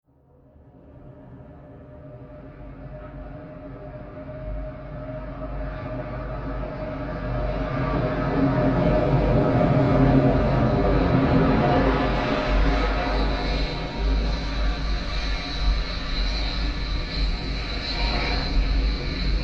Starcruiser SciFi Spaceship Exterior Passing Overhead, Star Cruiser Spaceship Exterior Passing Overhead Hum Of Motors; Spacecrafts; BBFX